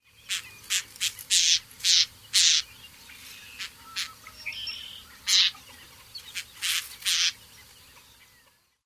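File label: buzz calls, Marsh Wren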